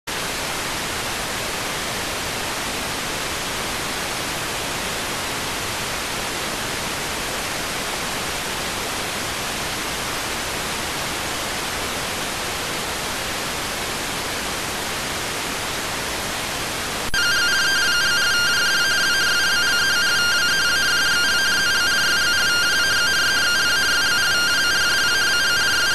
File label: rtty rx